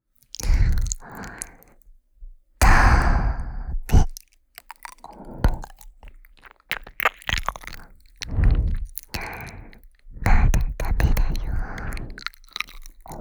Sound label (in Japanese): 耳舐め×射精我慢; 音圧アゲアゲver